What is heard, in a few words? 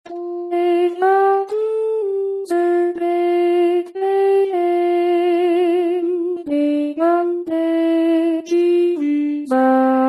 puer natus Alti staff